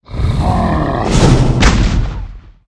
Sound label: snake attack